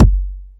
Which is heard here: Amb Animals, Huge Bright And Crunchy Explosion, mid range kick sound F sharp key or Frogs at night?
mid range kick sound F sharp key